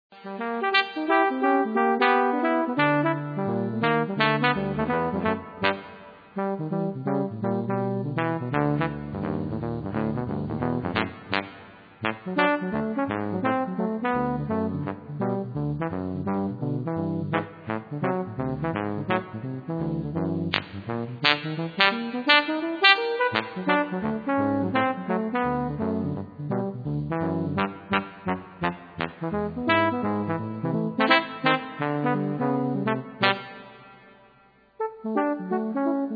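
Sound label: Maple Leaf 2 Tb, Trombone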